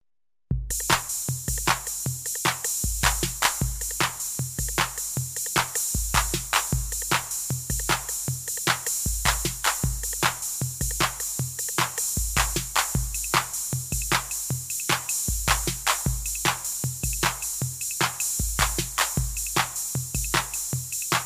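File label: drum sounds tom-tom set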